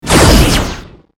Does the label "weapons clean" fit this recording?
Yes